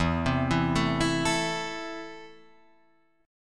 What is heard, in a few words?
E Suspended 4th Sharp 5th, Guitar Drop D; 2,3,2,2,5,5